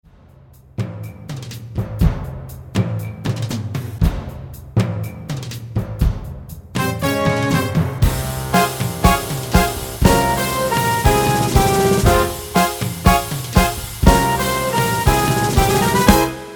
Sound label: Tango; Chicago